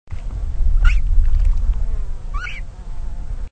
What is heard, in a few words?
fulica cornuta